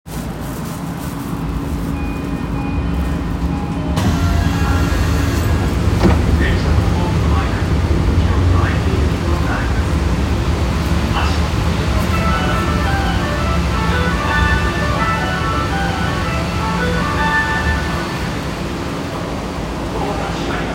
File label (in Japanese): 銀座線赤坂見附東, train sounds